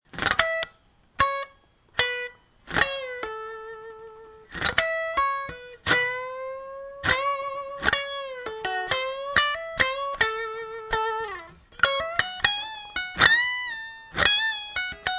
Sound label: flying v unplugged blues